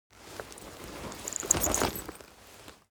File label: cloth leather metal handling long; foley; smartsoundfx